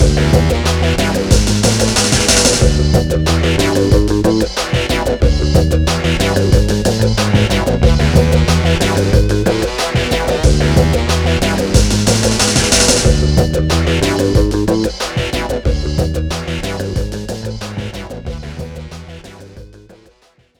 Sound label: GRUNGY, Bass Grooves; USB Soundscan vol.30; AKAI